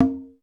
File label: CONGA H, SY99; Crypton - DrumBase5000